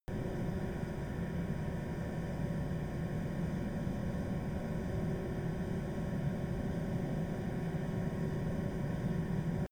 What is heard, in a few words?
military jet fa-18 hornet turbine idle direct